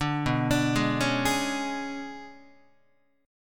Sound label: B Minor 6th Add 9th B down Guitar 4A 4E 5A 5D 5G sharp 6C sharp; Bm6add9 arpeggiated; JGuitar